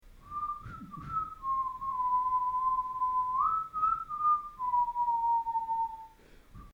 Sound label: Whistle In The Garage Human; Whistling Sound Effects